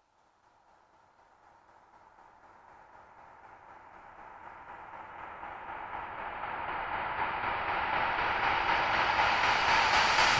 Reverse fx

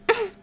Female Hurt

